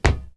metal footstep; everness

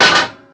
metal dug; everness

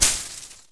leaves hit; everness

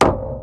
metal place; everness

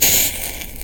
extinguish flame; everness

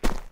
dirt hit; everness

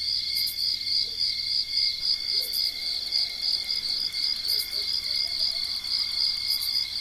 ambience night crickets; everness

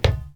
metal footstep; everness

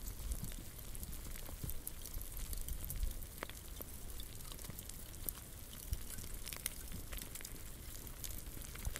ambience hot stone; everness

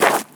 gravel footstep; everness

